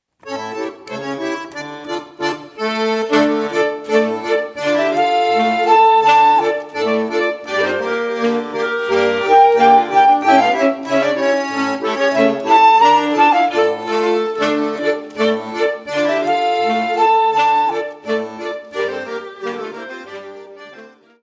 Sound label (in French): Polka, Belgique